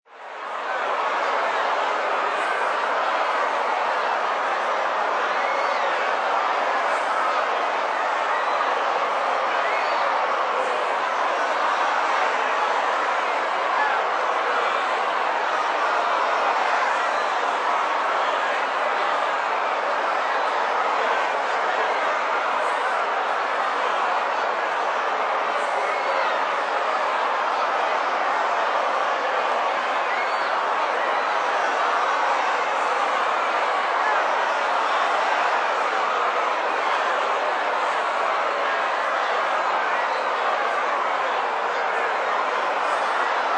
Crowd Angry Mob Protesting Large, Crowd, Angry Mob Large: Violent and angry crowd, riot; Crowd, Angry Mob Large: Violent and angry crowd, riot or pandemonium, people shouting and protesting.